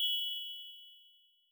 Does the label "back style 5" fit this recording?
Yes